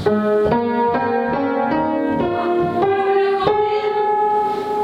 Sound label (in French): piano faux gamme